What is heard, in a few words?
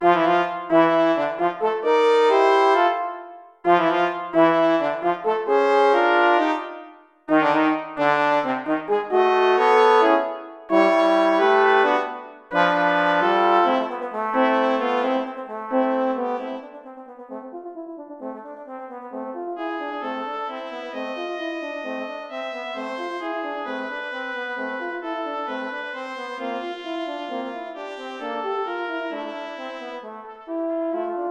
Brass Trio